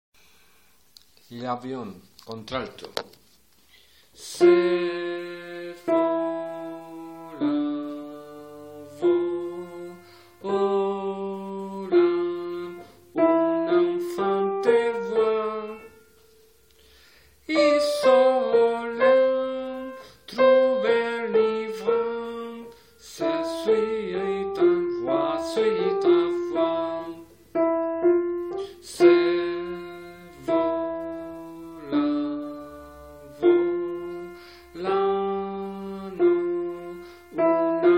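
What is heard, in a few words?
alto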